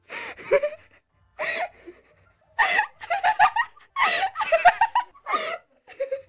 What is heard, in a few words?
Girl Laughing